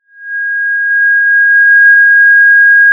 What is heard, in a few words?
Sound of a police car siren